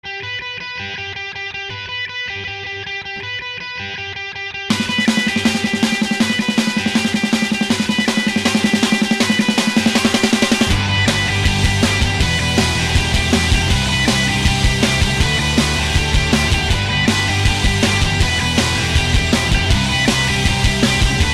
Guiter rock, ringtone

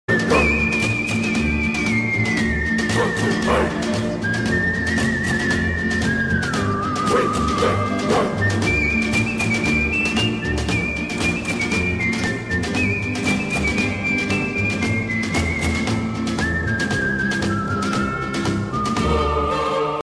Cowboy whistle

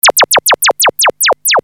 techno gun; Sms ringtones

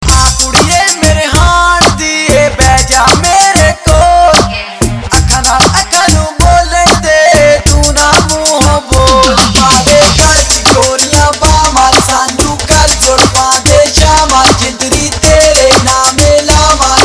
punjabi

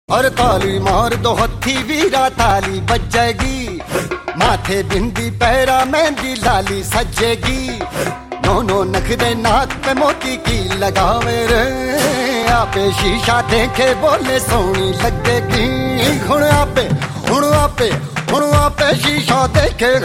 Taali (Solo)

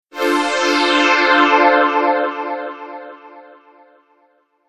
Chillout sms

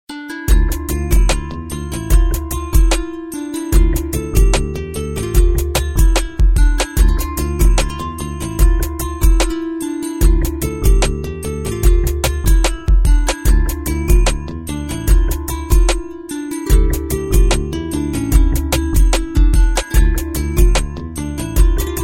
Love sad, Bollywood ringtones

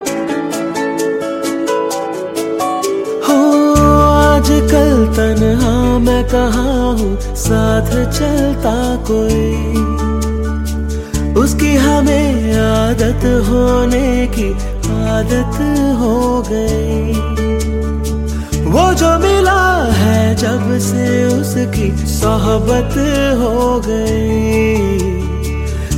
Once slow tone, ringtone

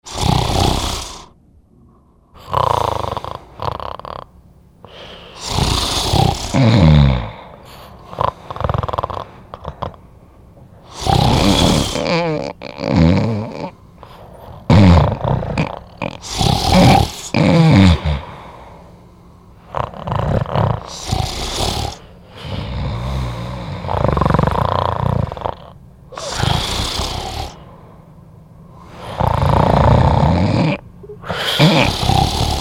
Farting Snort; Sms ringtones; The sound is a combination of a snort, a fart, and the sound of crackling.

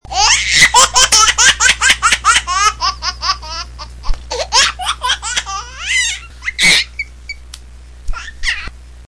baby evil laugh; Funny ringtones

